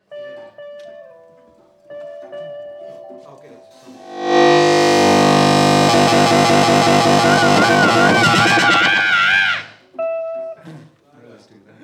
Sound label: Aaargh, Orchestra; Cube Orchestra; Rehearsal